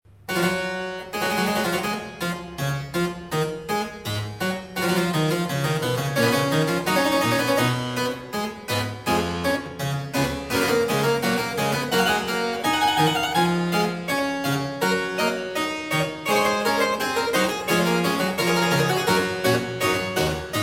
Goldberg Variations, BWV 988 Variatio 10 Fughetta. a 1 Clav; J.S.Bach